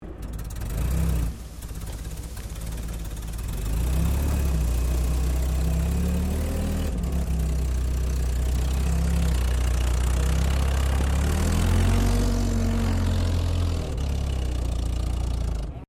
Armored Car Daimler Ferret Start, British Ferret Scout Car; Trucks; Vehicles; SoundEffects-Foundation1